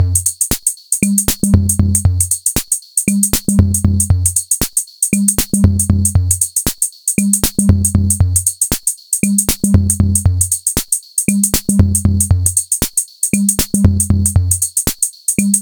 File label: Looking Back Groove